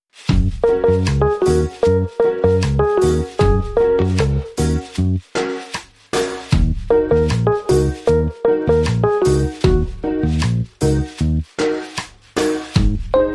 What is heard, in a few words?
Reggae Riddim